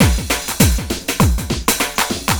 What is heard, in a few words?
drloop, drumloops, Vinyl Attack; Masterbits